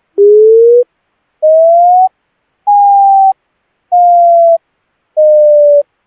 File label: Pulsed Wail